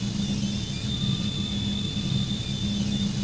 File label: buzzer pshhhh; cs paranoia